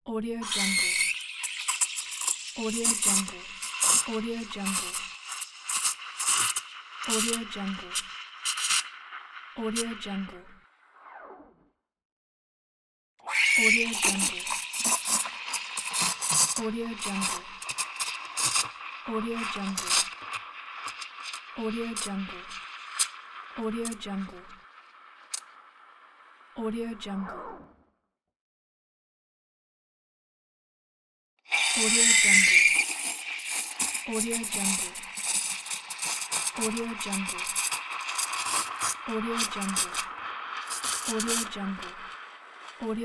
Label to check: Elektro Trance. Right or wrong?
Wrong